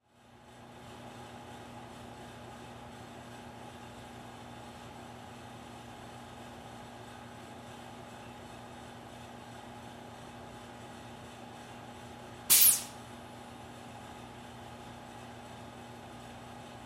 Fountain Drink Machine Co2 Release; Restaurant; Soda Guns; INDUSTRY

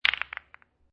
Billiard Pool Snooker Billiard Spread Shot Softer

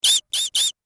Arcade Game Squeaky Screeches; Video Game Sound Effects; Retro Inspired Sound Effects - Think Nintendo, Atari, C64, Etc.

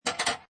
Pinball Sound Metal Plates Click; Video Games

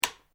Switch Light Flip Japan On; Various switches; Lights (Sound Effects:Household)

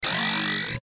Ascend Bell Flange; Blastwave

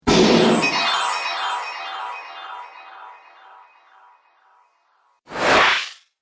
Magic Spells; Magic Sound Effects

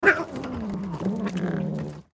Cat Eat Snarl; Animal; Cats; Blastwave

